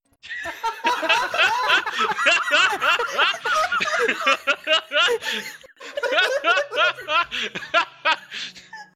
laugh

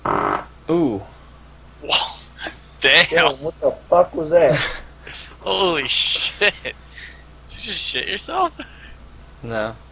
fart

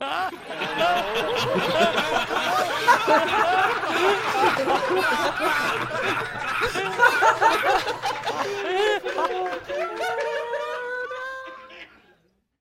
laugh

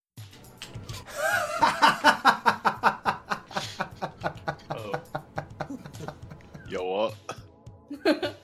laugh

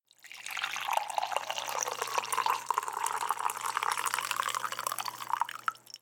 pour

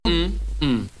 mmmm

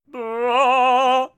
bwaa

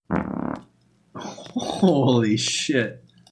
fart

